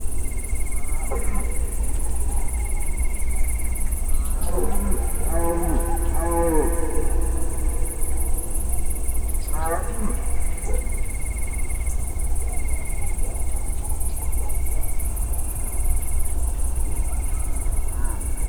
madar, gimszarvas; termeszetben

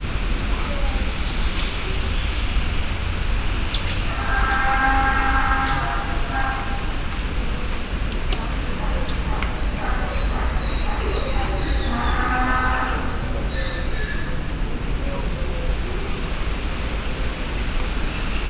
bőgés távolról aranyfejű oroszlánmajom szél, magyar parlagi szamár; szegedi zoo 2011

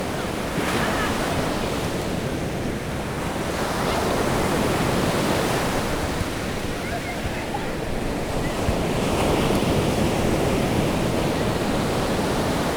Copacabana hullámok balra kisebb labdázás

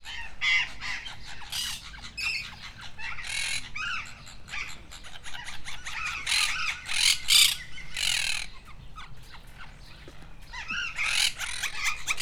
csapatban, barátpapagáj; debreceni zoo 2012

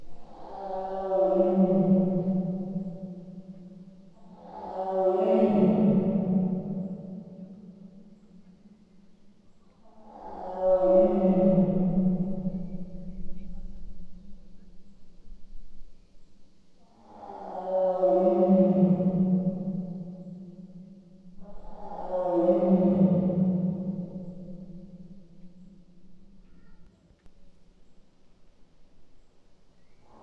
a tigrisház mellől, szibériai tigris; allathangok; veszpremizoo 2013

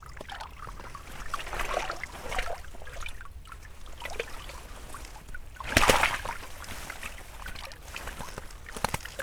szákban, ponty; termeszetben; recsk

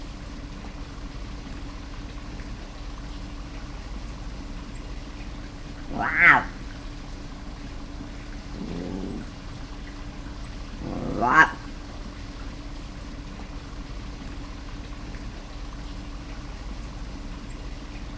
éjjel háromszor halk, mocsári hiúz; jaszberenyi zoo 2016

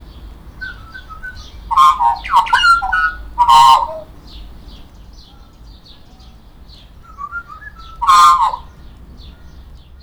bemelegítés, feketehátú fuvolázómadár; debreceni zoo 2012; marantz puska

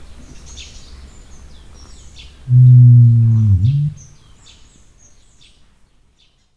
nandu; miskolci zoo 2009

